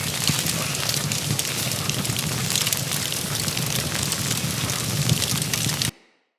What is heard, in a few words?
Grineer Death Fire, Fire Skeletal Death; Characters